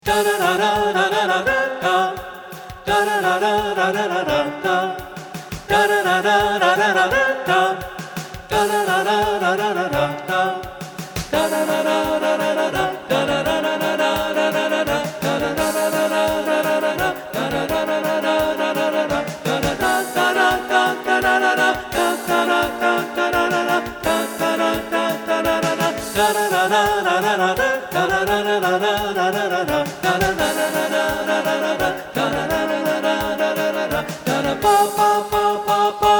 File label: Medley; Chorus Line